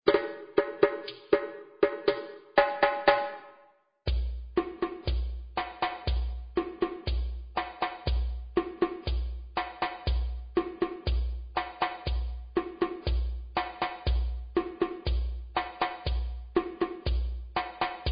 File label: Djole